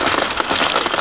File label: cogs turn; Heretic II; items